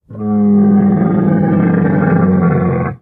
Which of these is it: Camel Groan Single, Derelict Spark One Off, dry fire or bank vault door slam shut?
Camel Groan Single